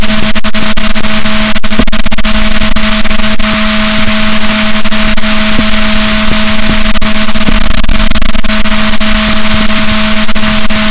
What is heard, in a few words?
rorquals negatif, boat; Balaenoptera Physalus Chile